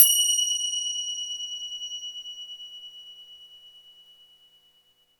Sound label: FINGER CY L, Synclavier Percussion; ILIO; Synclavier Percussion 2; World & Orchestral